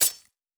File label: Stab